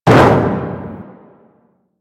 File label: Metal Barrel Impact; Warframe; Ambience; Nineteen Ninety Nine